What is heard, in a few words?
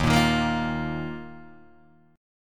Eb Suspended 2nd, Guitar, DADGAD